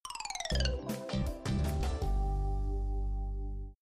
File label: game lose effect